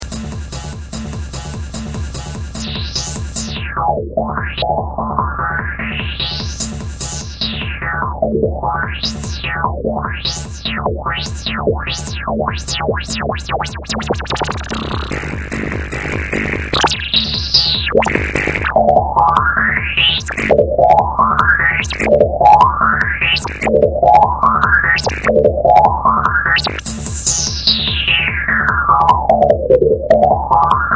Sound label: LOOP